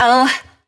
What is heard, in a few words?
Percussive FX; Vengeance Samples; VEH1 Percussion